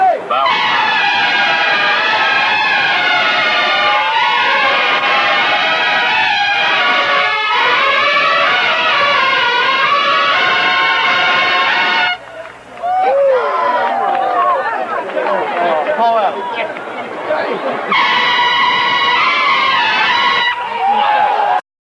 trumpet solo

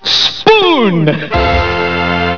tick spoon; with fanfare

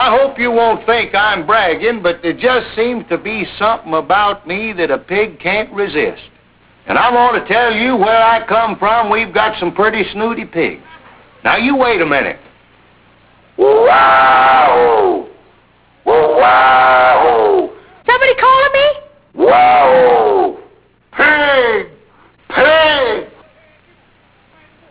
pigcall

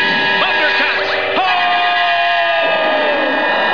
Hoooo; "Thundercats! HOOOOOOOOOOOO!"; Frodo

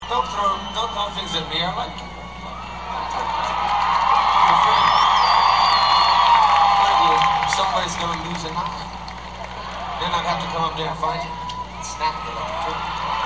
Snap

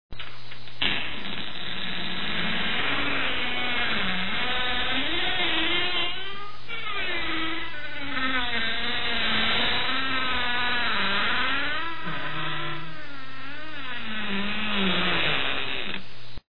CREAKING; Halloween

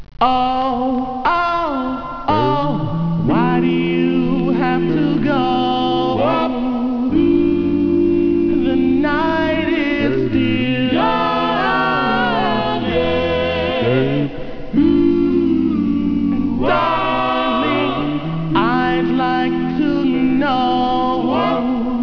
acapella; CLIFTON RECORDS; vinyl

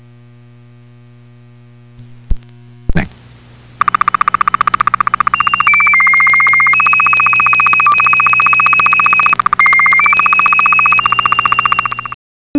Sstv; Slow Scan Television signal

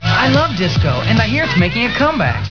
disco

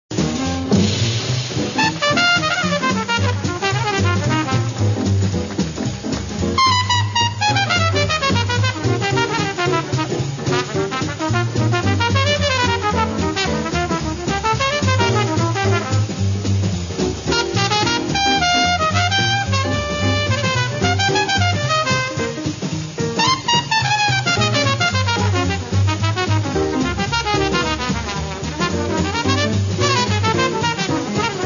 trumpet

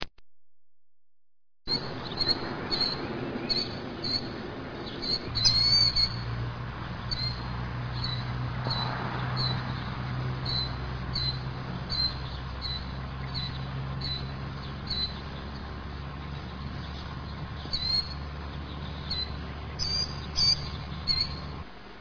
killdeer

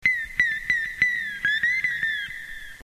Chirp

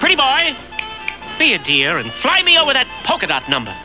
POLKA

